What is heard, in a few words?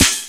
SNARE, Skanky Perc; Hip Hop; E-MU Formula 4000 Series Vol. 1; Hip Hop Nation